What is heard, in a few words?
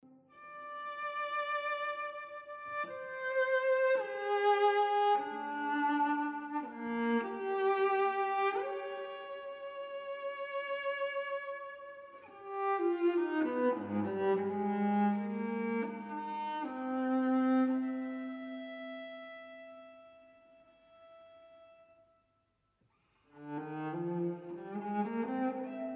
Lento molto espressivo, Cello Solo